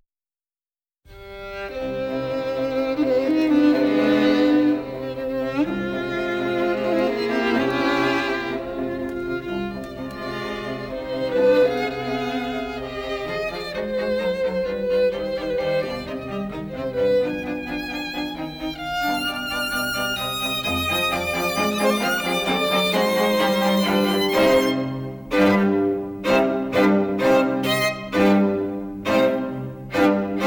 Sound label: strings high loudness; beethoven; landr